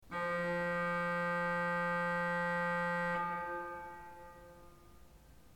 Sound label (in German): ton, orgel